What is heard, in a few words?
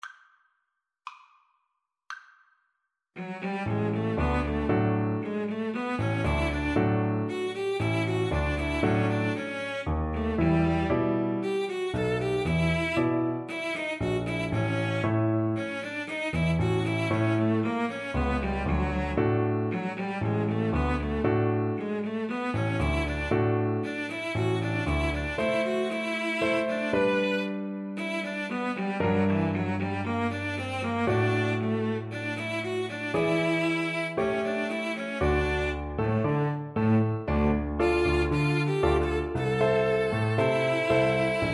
Cello Duet